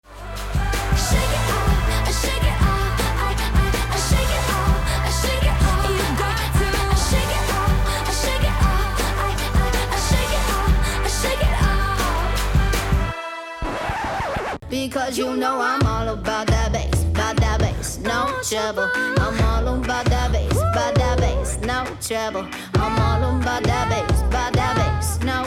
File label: chorus mashup